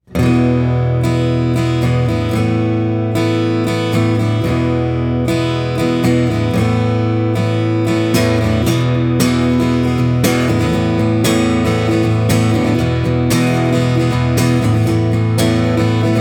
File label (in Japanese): アンセム ストローク